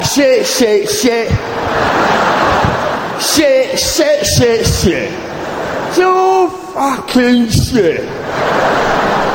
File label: Msg Tone; Lee Evans